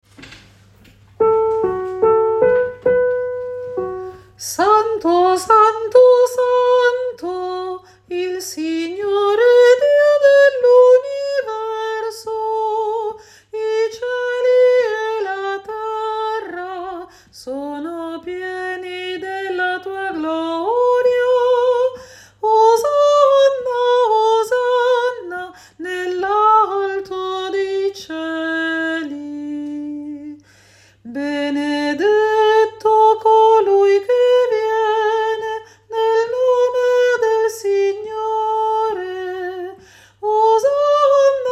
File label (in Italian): Santo canto e Coda polifonica Soprani; Santo liberto